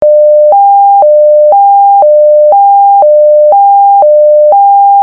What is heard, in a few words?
Tone